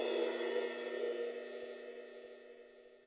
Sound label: HiHat & Crash, Roland TD-20; HiHats